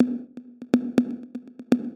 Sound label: 122 BPM Toms; mmb studio melodic